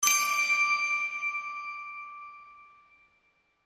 Intro Ping

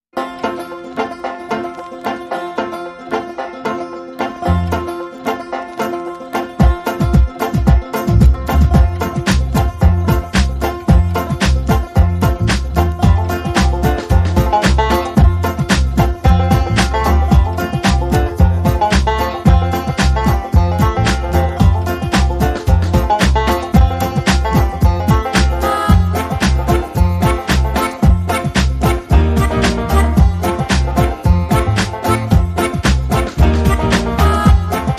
Banjo, No Sax

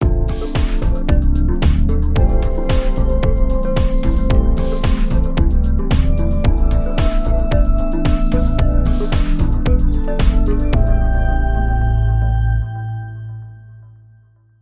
Trance

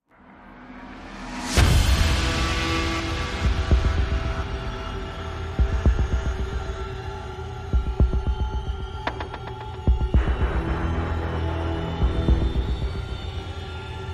No Kick Drum and Less Percussion

